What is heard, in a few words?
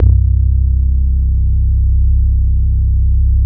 MOOG BASS; East West - Phat & Phunky CD2; 90's Sample CDs